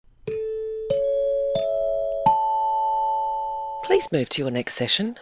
four chimes ascending please move to your next session